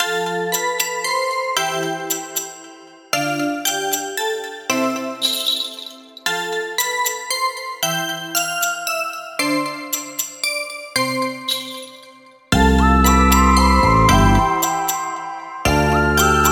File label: free bgm that can relax naturally at night